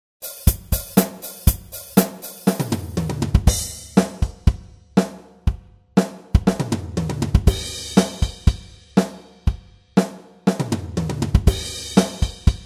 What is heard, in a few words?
drum